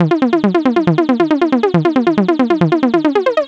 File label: euphoric trance lead arp 138bpm 2 bars